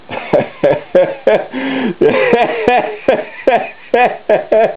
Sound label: ehehehe